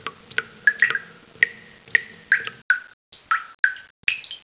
lab drips

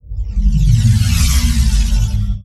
zoosh

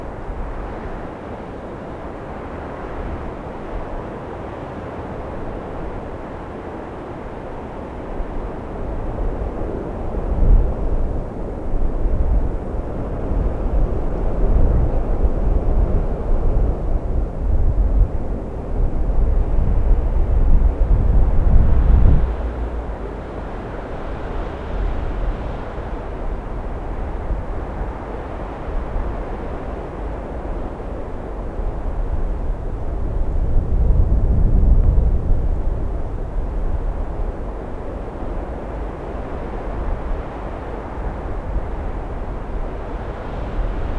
ambience desert day; machupicchu

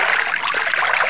sink

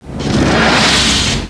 through doorway

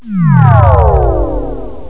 odd warp

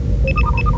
bleep bleep

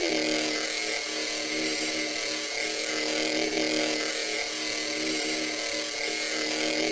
ambience energy

